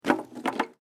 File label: WOOD PLANK MOVEMENT